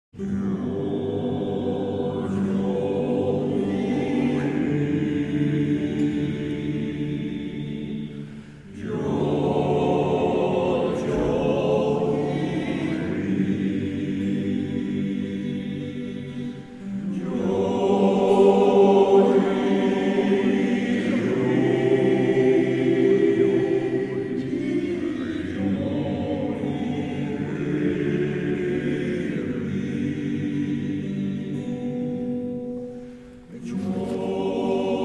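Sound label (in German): Andachtsjodler, Männerchor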